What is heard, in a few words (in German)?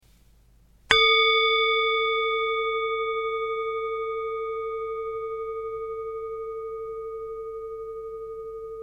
Klangschalen Set